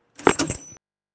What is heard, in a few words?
locker